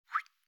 HAPTIC EFFECT, Sharp AQUOS phone ui; AQUOS sense lite SH-M05

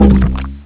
jump mud; Nokia; 5800 XpressMusic; Bounce Touch

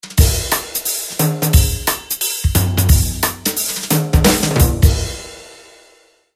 Brush Groove; Sony Ericsson; T650; PlusFourSix; Sania; Lars Edvall

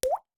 Plop, notifications; Yota Yotaphone-2

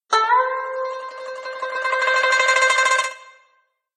Zither, notifications; Lenovo P780

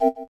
Camera Failure; LG L600i

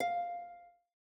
Harp, notifications; OnePlus 11

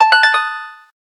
harp zero, keytone; SKY IM-A830S-VEGA-Racer-2

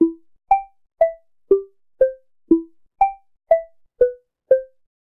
Electronic; Realme 8

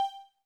movie stop; NEC Casio GzOne Commando 4G LTE C811

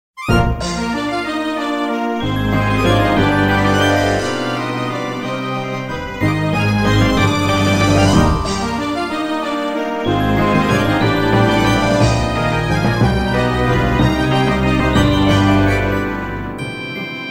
Orchestral; Yamaha SMAF demo ringtones

